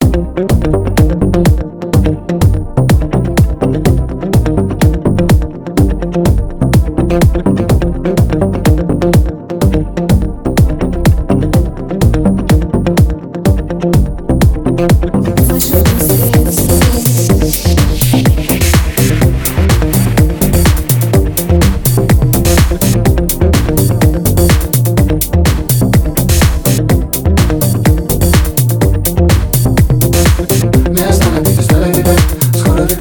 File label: deep house remix